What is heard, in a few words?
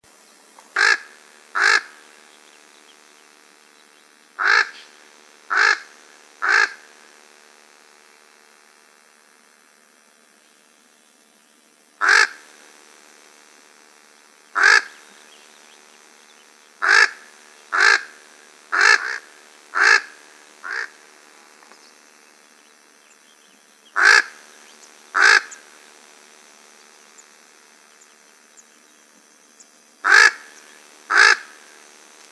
Corvids; AZFO; deviche